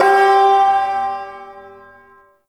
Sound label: Shawzin Oct One G; Warframe; Duviri Ersatz Shawzin